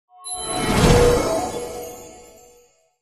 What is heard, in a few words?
disappear